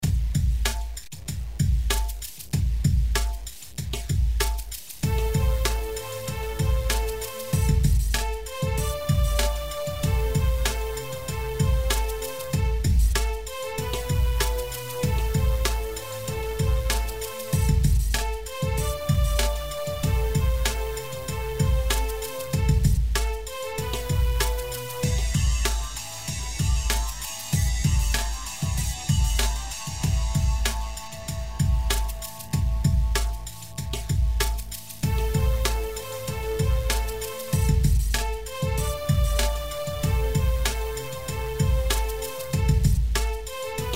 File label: Hiphop